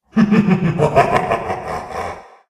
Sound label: evil laughter